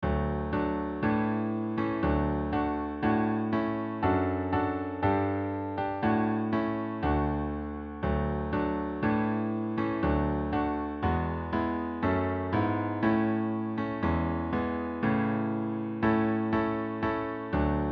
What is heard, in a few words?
Hark the Herald Angels Sing Chords D Major